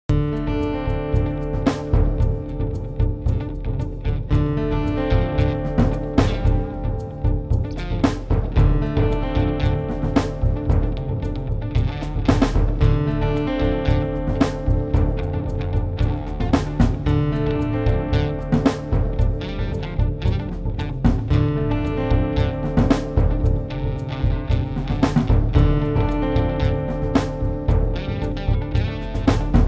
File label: menu music; WolfQuest 2007